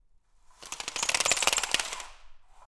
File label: Pulling Blinds